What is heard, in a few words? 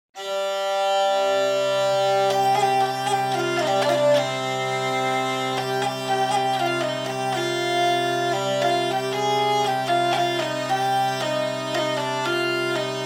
zanfona